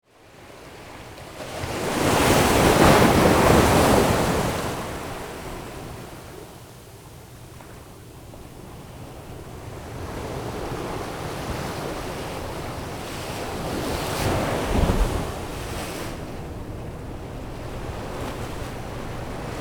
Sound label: crashing waves